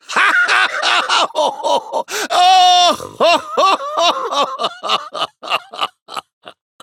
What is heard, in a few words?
battle laug; dqxi